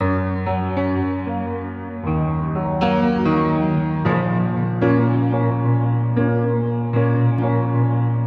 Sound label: Piano Loop